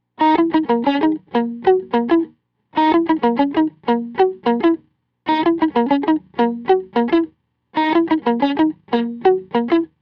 distorted neck

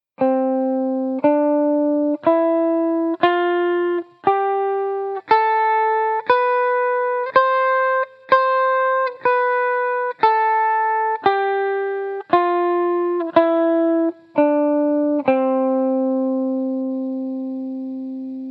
scale example; Example scale: C major ascending and descending, one octave, 60 BPM.

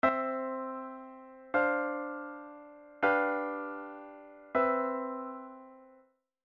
C MAJOR ii V I Inversions

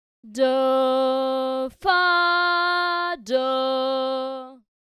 t sd t with sd above t; Tonic-subdominant-tonic, with subdominant above tonic

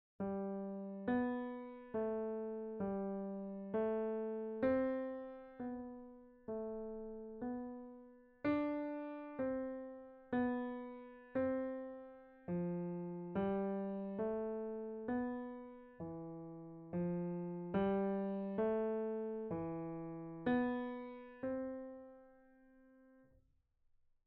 piano; Exercise 5

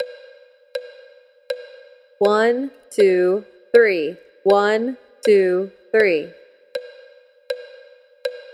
Quarter Notes; Quarter Notes, Example 2

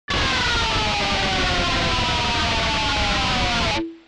1 String Pick Slide; guitarist

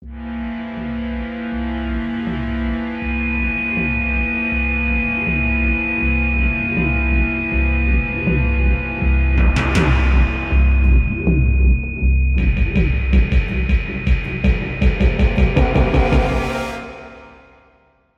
Halloween Improv; Halloween horror music

